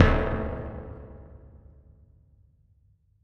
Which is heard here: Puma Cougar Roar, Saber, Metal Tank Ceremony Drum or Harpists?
Metal Tank Ceremony Drum